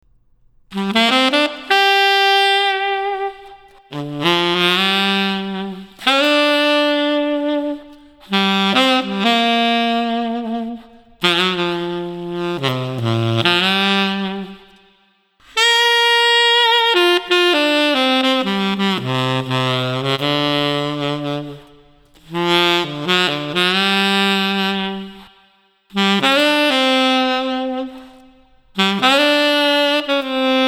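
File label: Street Sax Bluesy Smooth, 120 bpm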